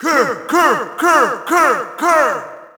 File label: Shout, audience; UFC Tapout 2